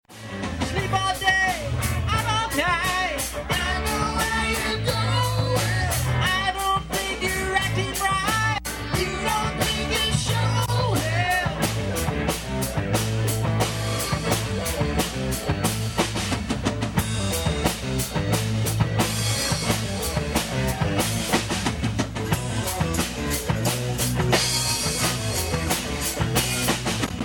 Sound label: Funk